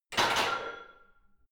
barbell heavy plates replace rack hard, fitnessstudio